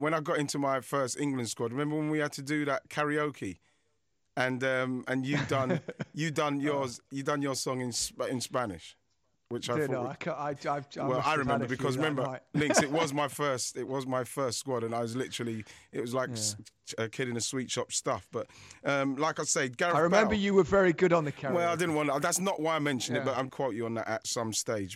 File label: karaoke; gary lineker